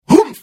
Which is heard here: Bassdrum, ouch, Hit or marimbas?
Hit